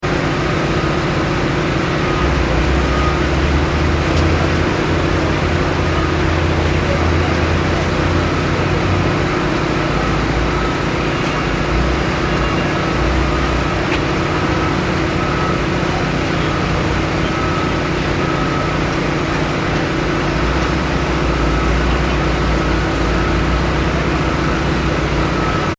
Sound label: ambience construction site large; Loud Generator Noise Close-By And Construction Ve; Ground Zero Nyc; McKinney Sound